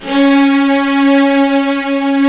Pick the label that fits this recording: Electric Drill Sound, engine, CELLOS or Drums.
CELLOS